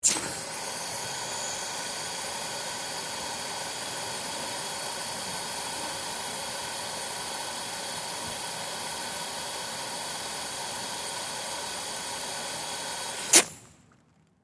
Water Garden Hose Pipe Hiss Faucet On Run Off Short; Yard Tool Sound Effects; Big Room Sound